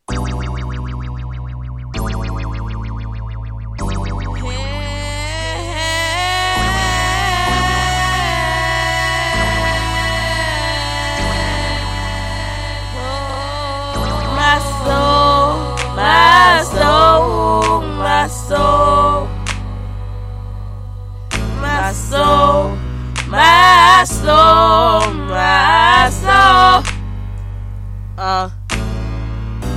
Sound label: acoustic